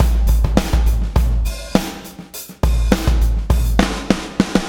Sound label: BLOW, BLOWUP